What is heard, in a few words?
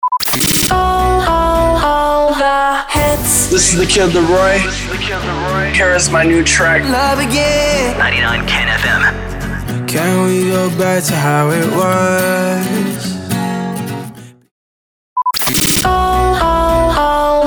SWEEPER POWER INTRO 107BPM